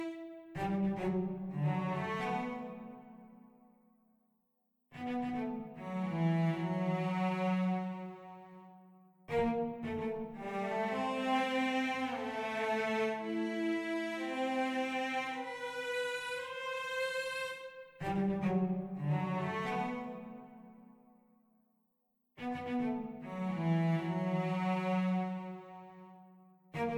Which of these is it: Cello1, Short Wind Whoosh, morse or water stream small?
Cello1